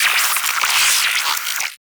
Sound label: Tiny Creatures, Ambience; Warframe; Corpus Railjack